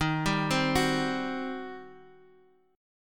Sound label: G Major 7th Suspended 4th Sharp 5th, Eb